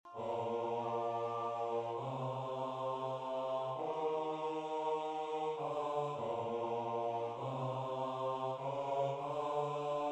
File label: Bass